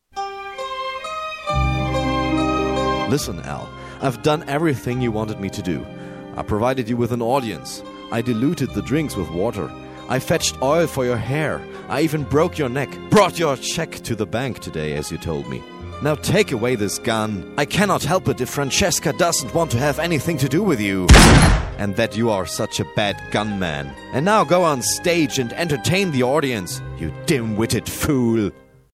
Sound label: intro jingle; englische Ansage für Gastspiel in Las Vegas